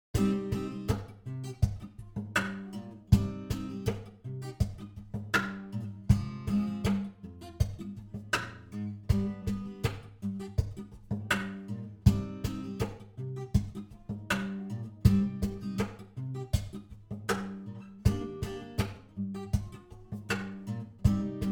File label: guitar